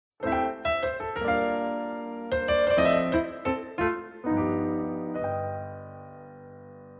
Message tone

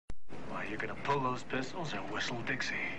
Whistle

